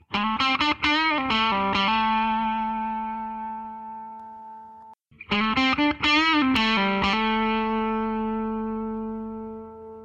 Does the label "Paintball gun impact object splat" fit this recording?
No